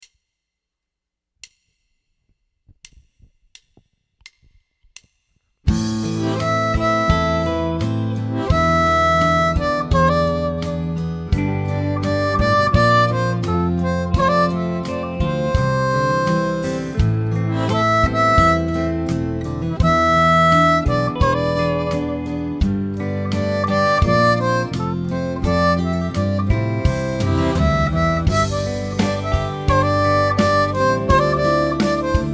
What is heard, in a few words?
Am 85 harp